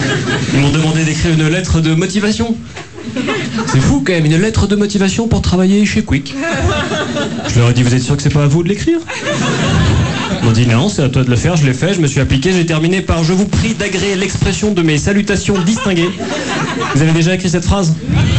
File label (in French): Rire